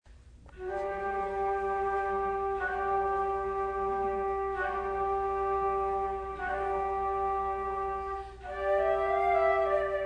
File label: Duets Bagpiper